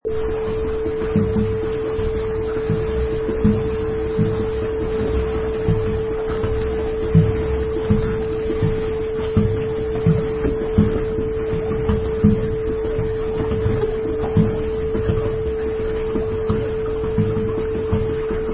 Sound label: Paddle Boat